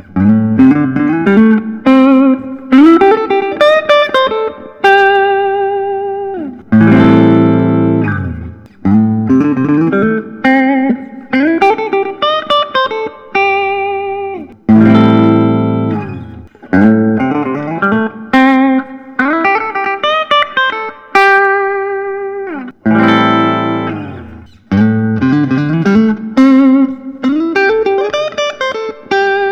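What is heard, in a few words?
prs private stock mccarty; honey gold smoke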